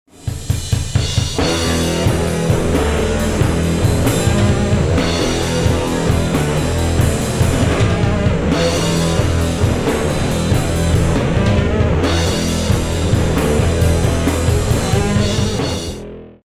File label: Ditty; Rugby League 3; Wii